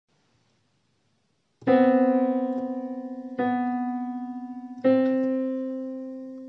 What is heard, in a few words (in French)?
demi ton